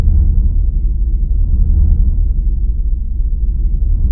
elevator amb loop low drone; Ambient

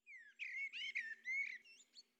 blackbird; FontainebleauDemo; MidDay

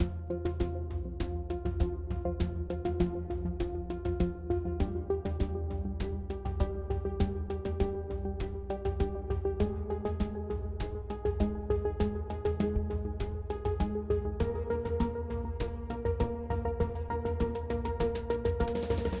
Music Final Theme

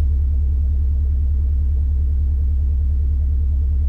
Hover Car; Cars; Megacity

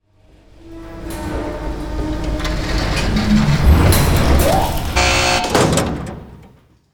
elevator intro; SpaceshipDemo

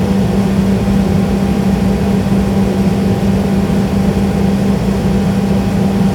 passage amb ventilation loop; Ambient; SpaceshipDemo

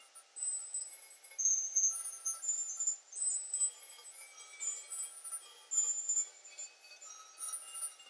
Treasure Chest loop; BossRoom

